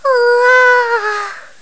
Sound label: ooa